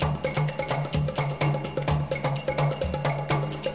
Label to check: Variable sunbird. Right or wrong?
Wrong